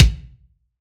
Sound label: BD, BASS DRUMS; EdgeSounds